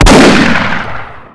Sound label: Big 357 shot; weapons